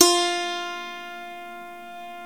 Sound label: SWEDISH LY, Swedish Lyre